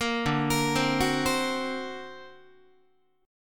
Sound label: D Augmented 7th D down Guitar; D+7 arpeggiated; JGuitar